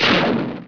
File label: Rocket Release